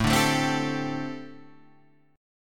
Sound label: G Suspended 2nd Suspended 4th